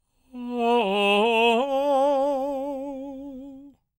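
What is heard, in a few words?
falsetto, Vocal Planet Jazz FX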